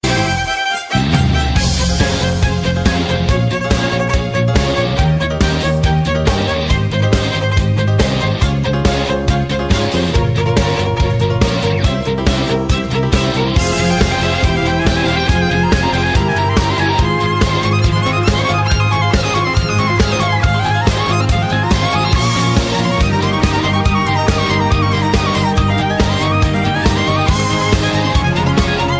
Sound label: Vivaldi winter rock, ringtones